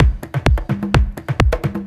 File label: Latin Loop 128 BPM